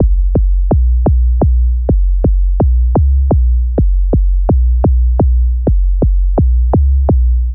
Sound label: 127 C Synth Bass